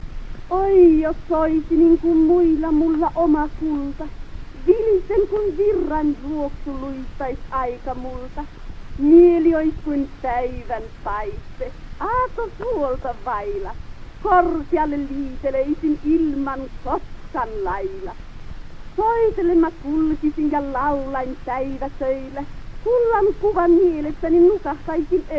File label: Gramophone